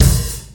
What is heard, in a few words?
Bass Drum Sound C Key, Royality free bass drum one shot tuned to the C note.